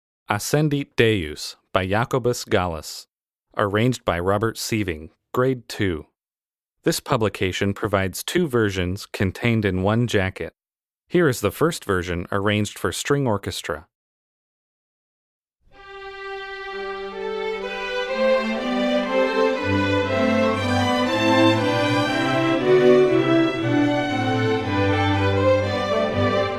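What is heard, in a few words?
for String Orchestra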